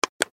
Button Switch

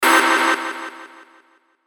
Hit Airy Shimmer; Hit Airy Shimmer Delay

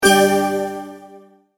Multimedia Tone-Stop

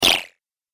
Cartoon Magic Spell

